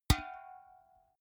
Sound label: big can empty hit